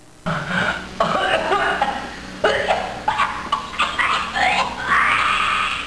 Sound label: choke